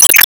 Option Attack Hit; Disney Star Smash; Android, iOS, Mobile

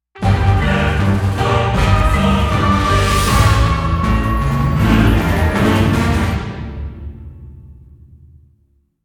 Transition

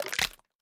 Pick Up Bone

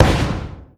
Spike Bomb

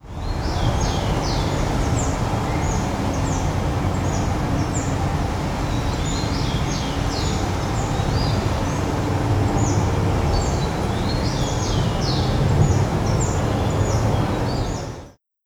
Cities Birds

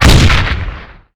Enemy Hourgrass Active Shot

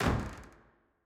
Door Open

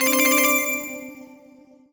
collect item jingle; Strike Force Heroes; Xbox Series X/S

